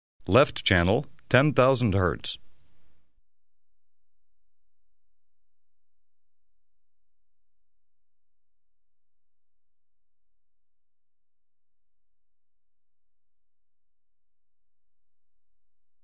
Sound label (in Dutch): Links een sinus van 10 kHz, -10 dB